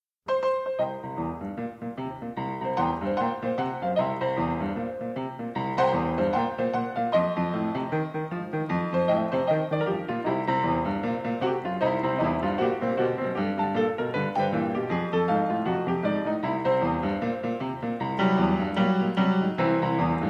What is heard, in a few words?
Boogie Woogie